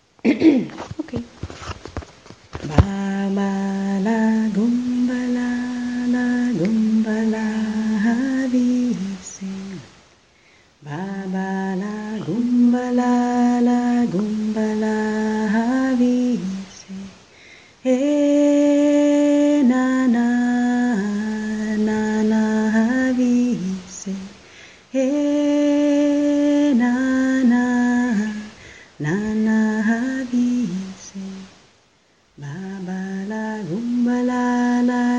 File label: Chanting